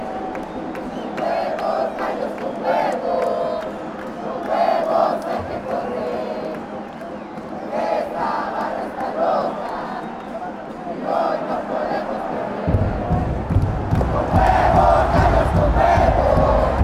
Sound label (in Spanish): con huevos gallos